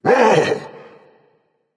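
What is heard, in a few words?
non verbal fear, voice; cernunnos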